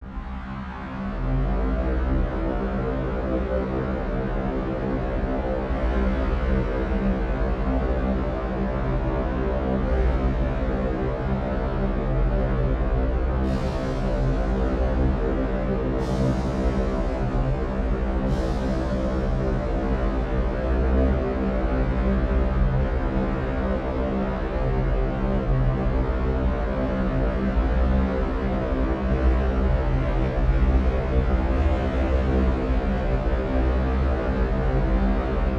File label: Creepy Sci Fi Drone